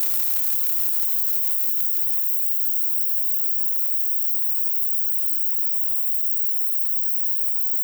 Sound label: Cymbal Noise Long; Lost Tapes From Mars